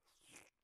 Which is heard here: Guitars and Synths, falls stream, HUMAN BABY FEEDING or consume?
consume